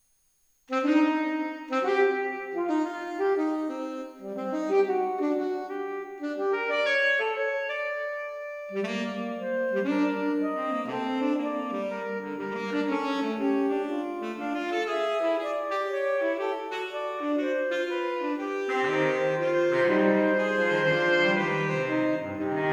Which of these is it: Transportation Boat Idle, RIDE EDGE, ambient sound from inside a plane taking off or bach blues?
bach blues